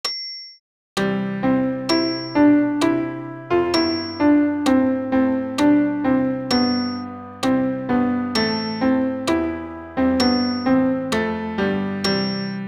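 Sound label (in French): Credo alto piano accompagnement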